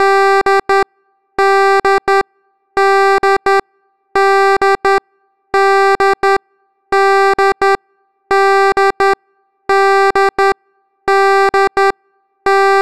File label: error, oven; prime oven